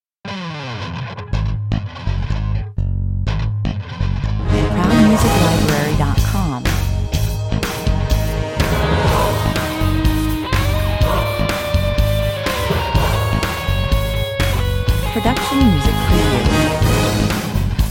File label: ects guitar 124 bpm